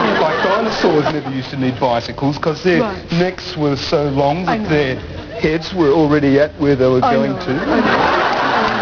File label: bicycles